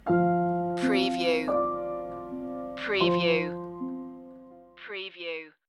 summer afternoon stinger

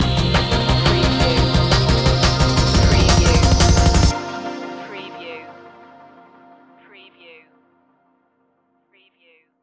liquid stinger

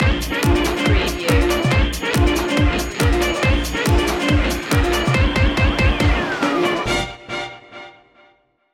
shuffle kerfuffle stinger

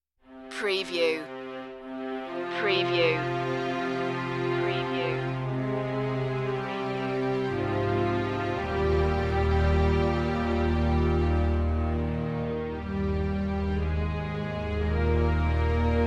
beyond redemption strings version

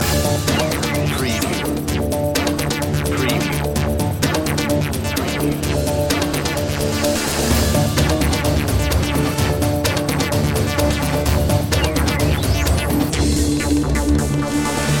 digital voodoo loop

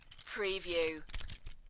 Key Rattling

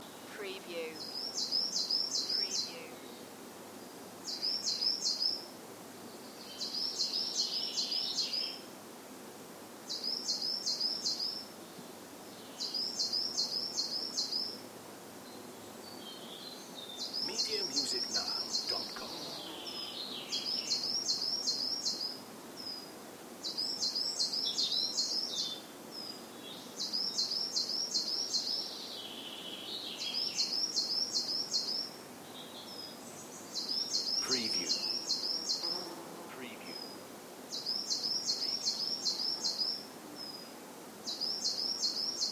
Welsh Riverside with Birds and Insects Loop; beanstalk audio

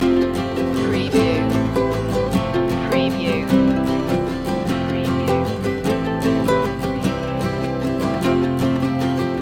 the nu-folk tune loop

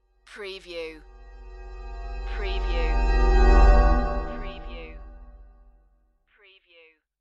Whoosh Metal

